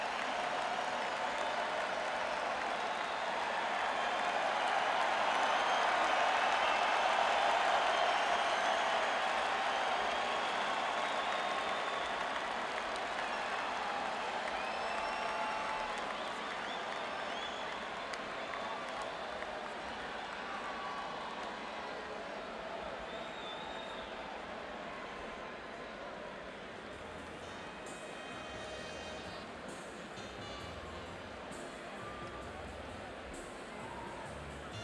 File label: Crowd; Albany, NY; Times Union Center